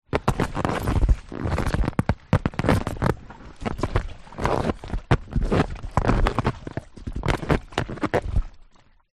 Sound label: transport boat rubber dinghy small float movements rubber squeaks